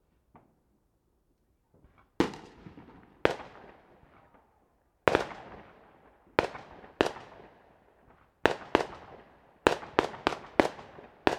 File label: Fusillade Fireworks